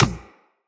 Beach Ball Kick; Water Fight

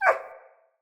Wolf Pain Yelp; Animals

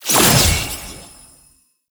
Summon Rune Pick Up; Warframe; Uriel; Vythelas

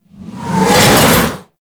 Golem Lift Scythe; Enemies; Attacks; Warframe

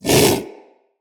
Vocal Bark, Hunting Critters; Characters; Predasite

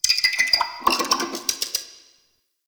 Interior Spider Clicking; Duviri Murmur

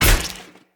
Sphere Polearm Medium Impact Flesh Armour Hollow; Weapon Impacts; Player; Combat

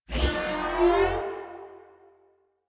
Plinx Alt Charge, Blastor Pistol; Weapons; Warframe; Corpus

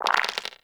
Open Dice Movie; Cetus Vendors; Five Fates

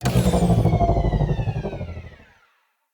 Ship Deceleration; Vehicles; RailJack

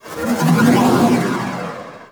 Shield Random; Warframe; Archwing; ArchwingShield

